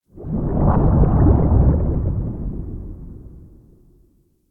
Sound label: Cave Underwater Whoosh; Ambience; Warframe; Stalker Cave